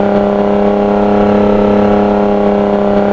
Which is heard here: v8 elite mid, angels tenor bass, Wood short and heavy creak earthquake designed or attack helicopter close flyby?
v8 elite mid